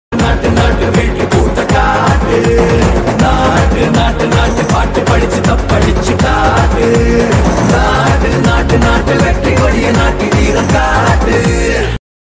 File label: tamil song bgm ringtone; rrr